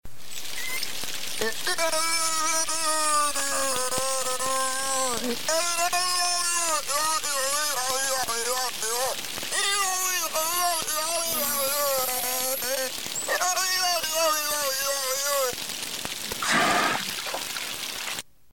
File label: beluga mimicking human speech